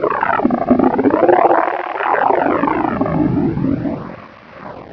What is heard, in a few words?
hc die; svencoop; keen